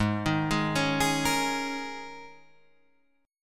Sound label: Ab Suspended 2nd Suspended 4th Ab down Guitar Open G